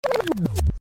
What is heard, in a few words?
reverse funk; tiktok